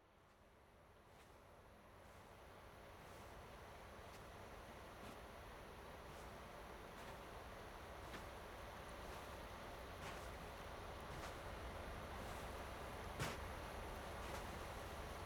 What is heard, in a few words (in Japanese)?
海の音、ボディーボードの音; アルジェ; 優しが浦でボディーボード！